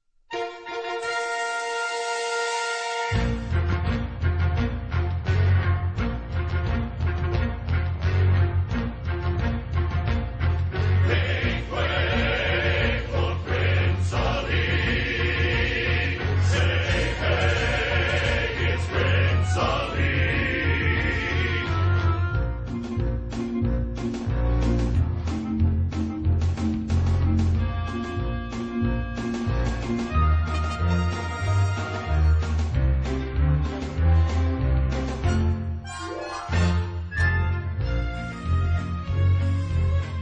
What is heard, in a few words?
Instrumental; Aladdin